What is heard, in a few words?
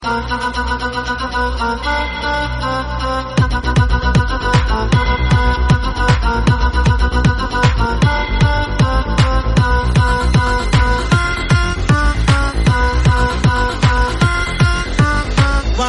arabic electro trap